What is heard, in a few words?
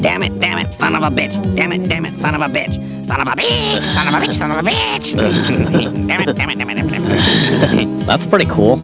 sob